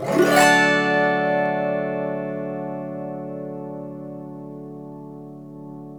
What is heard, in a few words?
AUTO HARP